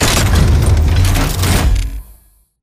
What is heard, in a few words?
HUD new titan loadout pickup; Titanfall 2